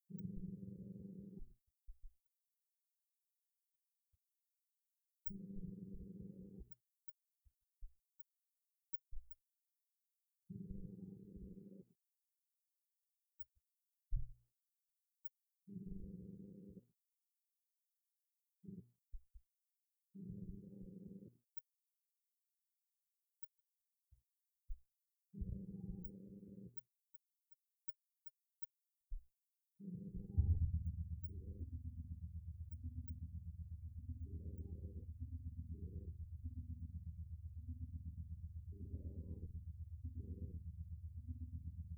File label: several species cave; visigoth